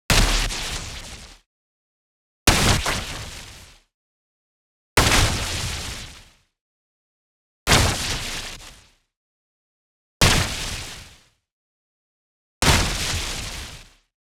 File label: IMPACT WHITE NOISE Short Snap; boom library; Anime Essentials